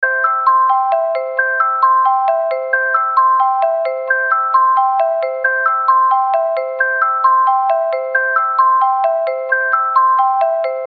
Musical Vibraphone Descend Delay Loop; Mallets; Blastwave

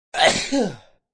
Sneeze, Sneezing; Sick; Human

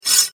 Sword Scrape Fast; Combat

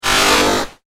Razor Edge Whoosh

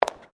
FOOTSTEPS WOMENS HIGH HEELS PAVERS STEP; Footstep Foley; Footsteps (Sound Effects:Human)

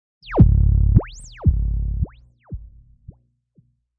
Long Zap; Zaps

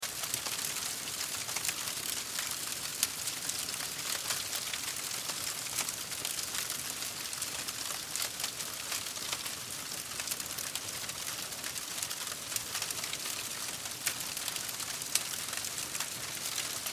Fire Leaves Pile, Fire Leaves Pile Crackle Loop; Flame Sound Effects

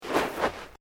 Footsteps Boots Sand Deep Step

